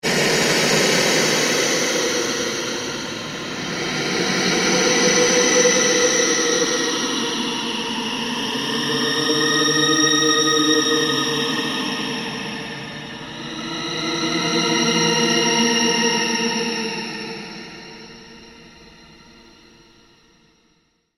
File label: Ambient Drone Slow Sweep